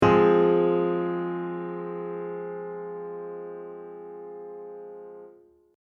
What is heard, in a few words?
Aural Tests Recog of chords; Grade 3; pop vocals